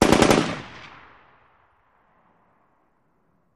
Machine Gun Sngl Burst; bursts

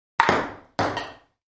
Ping Pong Serve Ball

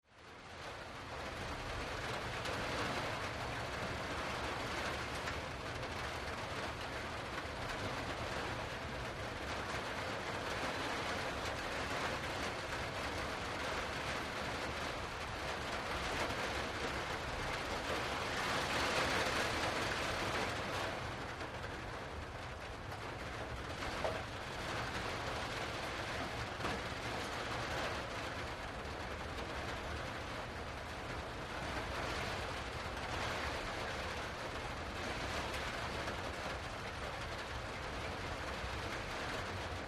Rain; Hard On Metal Shed Roof, From Inside.

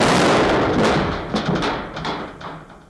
Metallic Bang Impac, metal impact

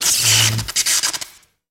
Elect Arc Scratchy, arcs & sparks

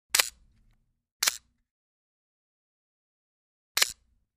Camera Manual Snap Med

